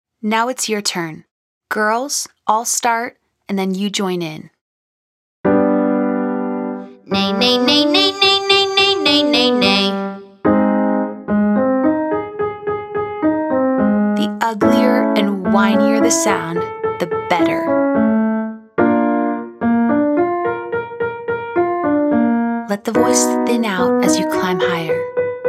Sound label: Nay Nay Nay Octave Scale Female